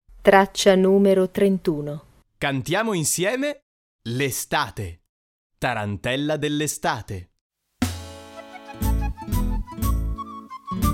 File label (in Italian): tarantella dell estate; infanzia